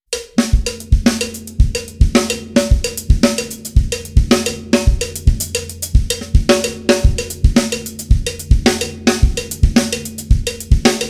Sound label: Songo-Groove, Latin; Songo-Groove 2; Schwarzes Album; Bum Bum Tschack Jazz Latin